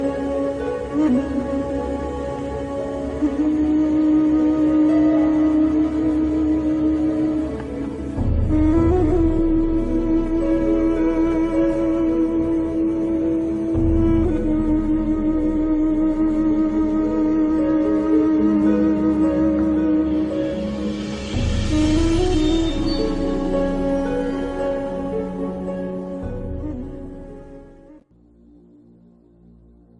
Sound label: background music; hazrat yousuf